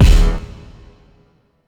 Synth Kick

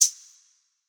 Openhats

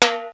Percs

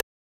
Percs

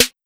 Snare

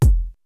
FOOT, Kicks

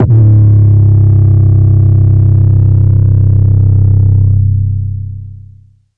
808 - C; Cymatics

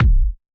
Kicks

